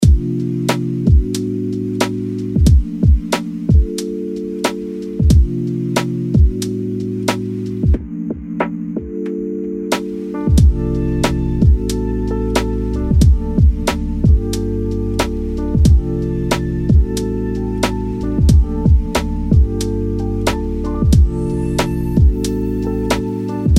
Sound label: Small Breeze, lofi beats